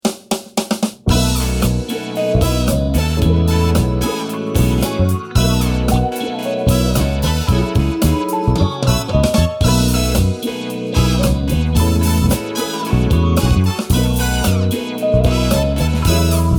tourne, batterie

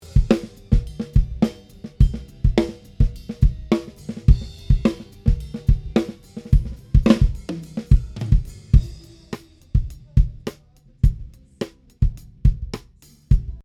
grosse caisse et caisse claire dessus seul, sans effet ni EQ; tout nu comme je les ai pris

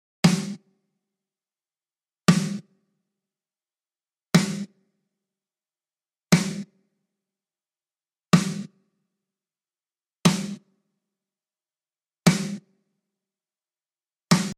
claire, batterie

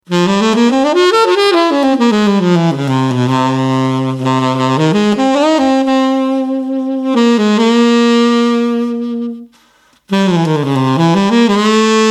sax, avec une petite égalisation je dégraisse un peu le bas et je relève un peu

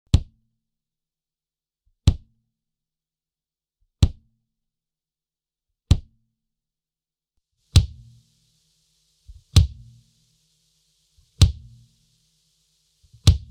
kick, grosse-caisse un peu artificielle; batterie; parfaitement adapté au jeu de double grosse caisse et au métal et fusion

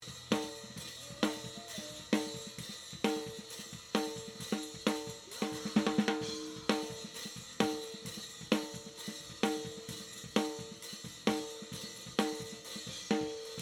snare, le micro Caisse-Claire dessus seul (le charlet passe assez fort dedans); batterie; concert; bruits ambiants

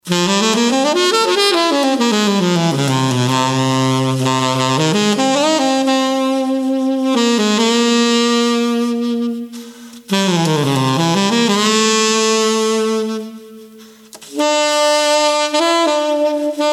sax, un autre variante de la sonorité pop; l'égalisation à un peu changé; on n'est plus dans la dynamique d'un son aéré genre Jazz; mais on a gagné et densité et en présence

